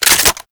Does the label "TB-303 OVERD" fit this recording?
No